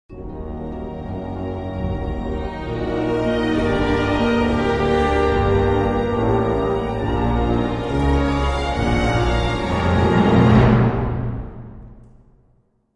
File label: ambience sith; Knights of the Old Republic II-The Sith Lords